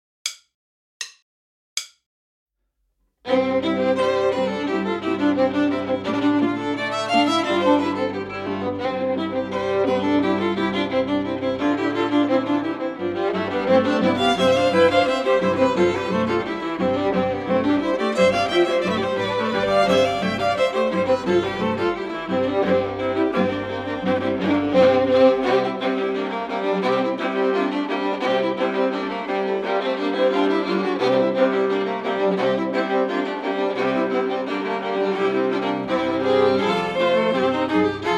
minus Cello

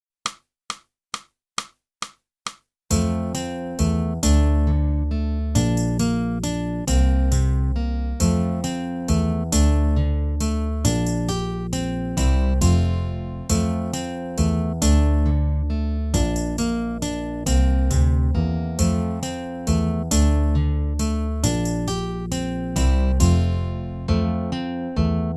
clarinet

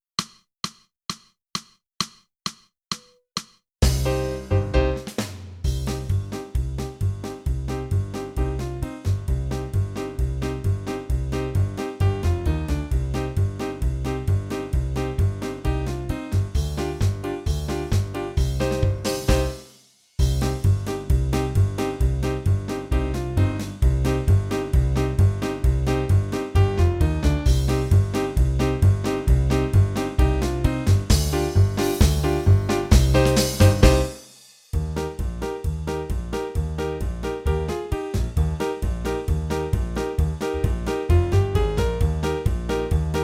clarinet

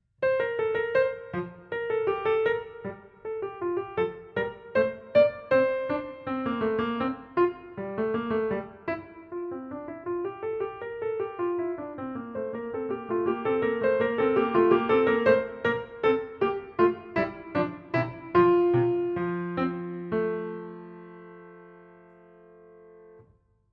piano

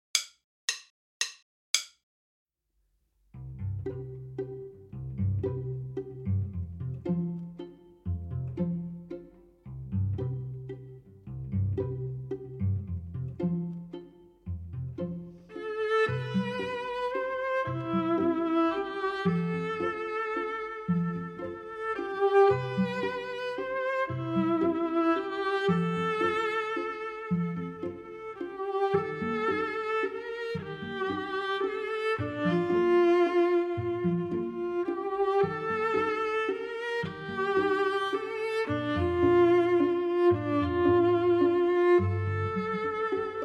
minus Violin I

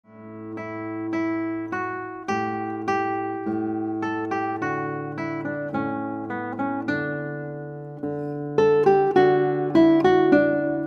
Guitar

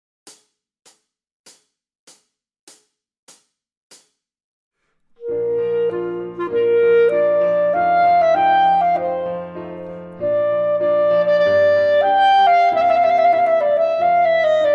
First Rep Theme Full Clarinet

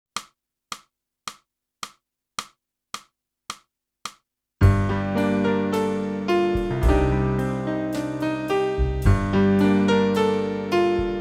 clarinet; boosey method

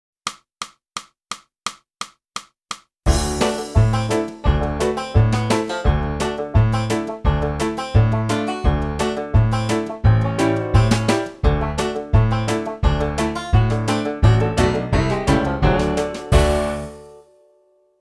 flute; Boosey Woodwind Method: Flute (Book 1)

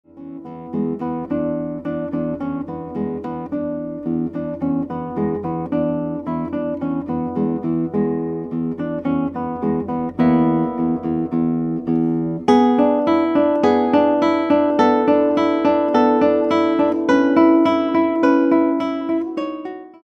Guitar

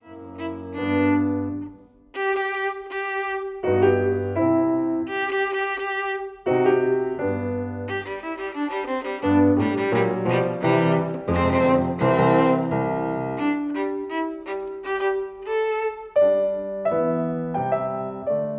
VIOLIN

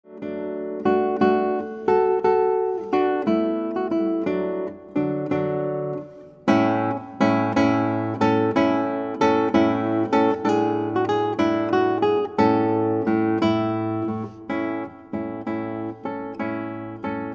Guitar

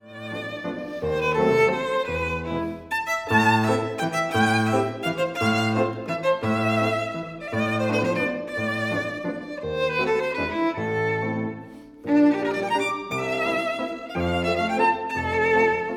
VIOLIN; Trinity College London violin exams for 2016-2019; Hubay: Bolero (from Morceaux Caracteristiques, op. 51)

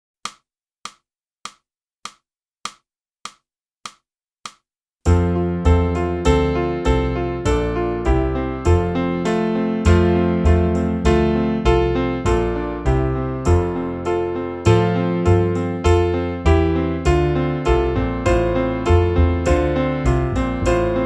flute; Boosey Woodwind Method: Flute (Book 1)

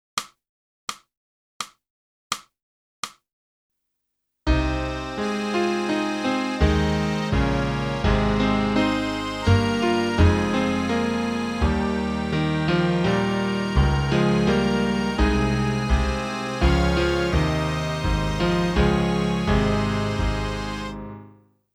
sax

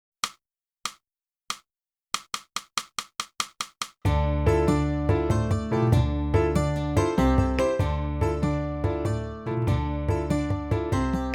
flute

